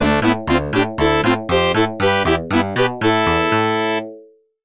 敗北ファンファーレ; bunny hunter zero

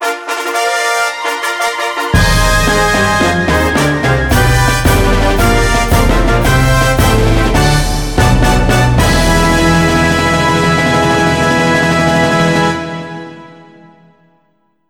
ファンファーレ; ガールズグランプリ

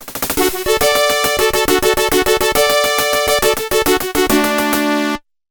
勝利ファンファーレ; Bunny Hunter Zero

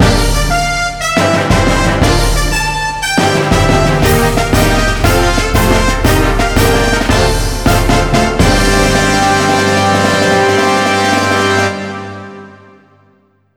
ファンファーレ; L級ガールズ決勝

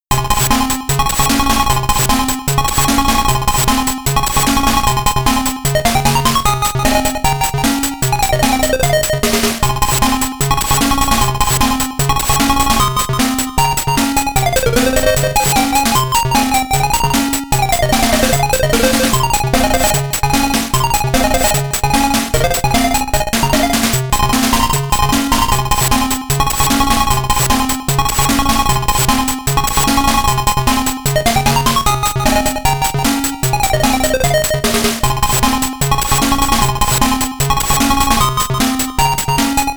地下BGM1 ／ 地下BGM3

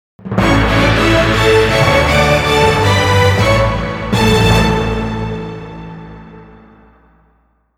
Bigボーナス開始ジングル; magical halloween7 original soundtrack

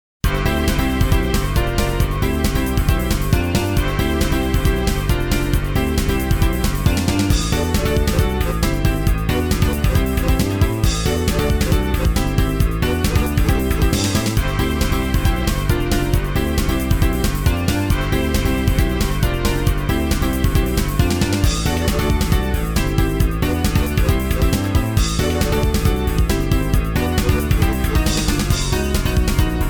レベルアップ; Shin Megami Tensei Devil Summoner sound file 1996

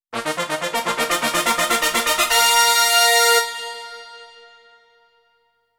絶好調のファンファーレ; sengoku ishin no hero daishuugo no maki original soundtrack; momotetsu daikoushin

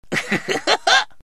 うふふっ; Inai Inai Baa Oekaki Wanwan

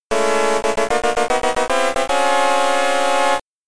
予選ゴール・ミュージック(ポールポジション)

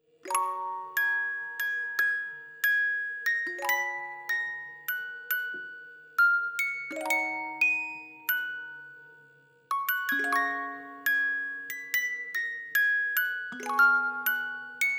～ on orgel

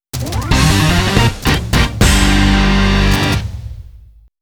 VSモード試合開始ジングル; Hajime no Ippo Portable Victorious Spirits; Fight！！

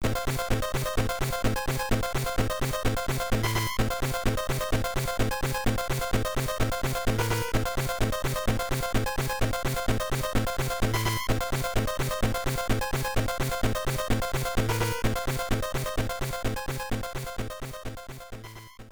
ステージ開始; Medarot

